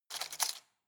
cutlery plastic drawer grab hard

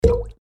water drop

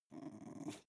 dog snarl snuffle

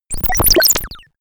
working robot

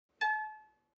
guitar clink single

